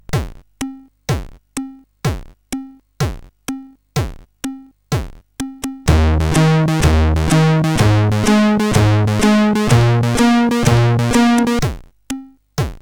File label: Sudan Rythm, CSG8580R5